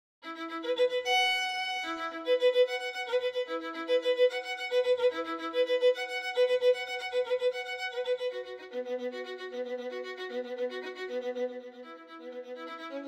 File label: ending for collab quartet